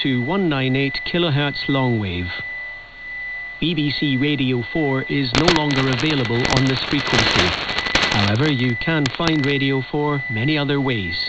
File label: websdr recording 198.0kHz